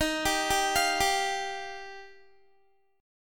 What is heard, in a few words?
Eb Major Add 9th, Guitar; Ebadd9 arpeggiated